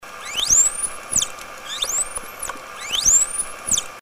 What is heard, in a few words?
SW Shy, dolphins signatures